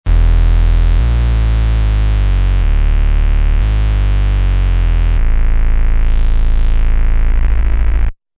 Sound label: Z-Bass, Roland V-Synth; Factory Patches